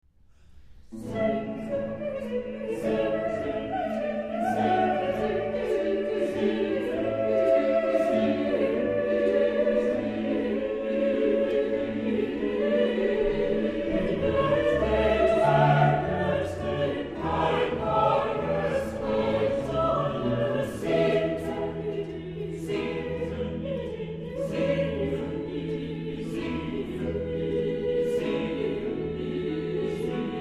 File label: Motette